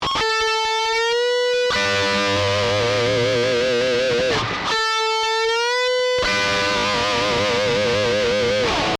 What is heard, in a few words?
Double Stop